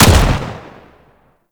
Pistol Shot; Warframe; Grineer; Kohm

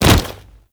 Generic Grab; Warframe; Characters; Tenno

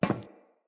Friendly NPC Step Default; Characters; Footsteps

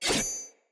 Axe Whoosh Stab; Weapons; Enemy; Axes; Glades Axe

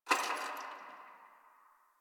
Ice Crack One Off; Warframe; Ambience; Nineteen Ninety Nine

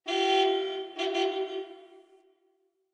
Distant Car Horn; Warframe; Ambience; Nineteen Ninety Nine

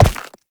Step Ice; Warframe; Uriel

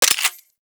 Hand Shotgun Alt Mech

